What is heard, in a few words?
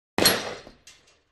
Pistol Fire Hit Targ